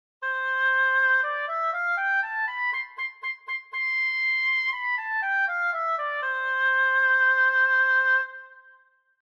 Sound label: Oboe